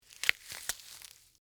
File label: Tear Rip Apple, Texture, Tear, Rip, Apple, Skin, Fruit; Ripping